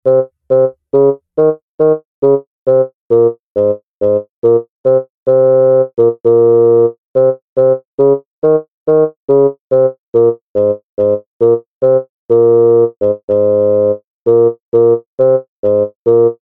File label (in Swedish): Fagott; 16 bit